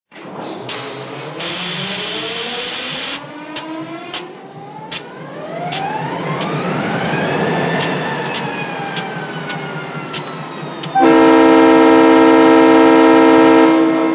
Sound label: GP-40